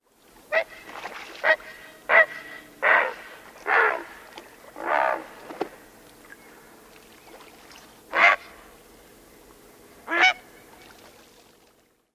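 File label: Great Blue Heron croaks given upon landing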